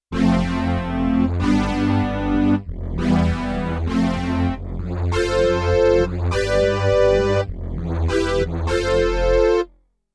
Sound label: Synth Brass-dual; Yamaha TX16W; Orchestral Performance 1